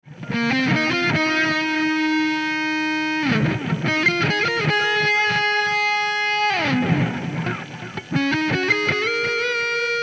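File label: distortion solo reverb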